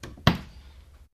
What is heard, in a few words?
Door Cupboard Open